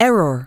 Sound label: error; TeamSpeak 3